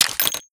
ammo take

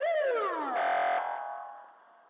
distant militsiya, ambience

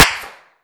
supp burst

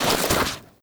disguise

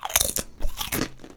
eat

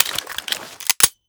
reload

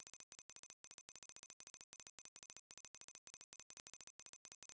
beepbeep

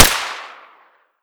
supp pistol

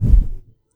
poof

